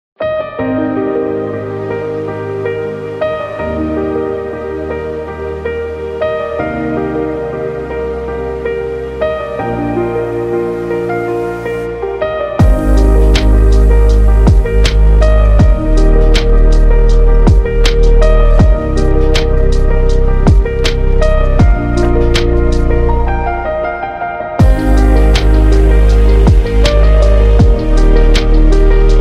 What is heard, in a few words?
Inspiring Piano Beat